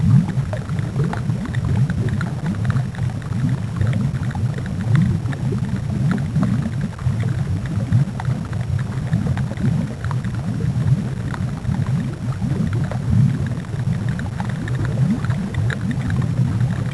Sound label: underwater